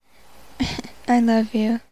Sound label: giggle i love you